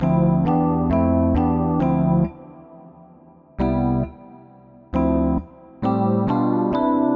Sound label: Chords 134bpm C